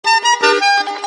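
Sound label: drop sms accordion balalaika and violin second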